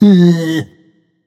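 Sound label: tongue, non-verbal; smite; voicepack; merlin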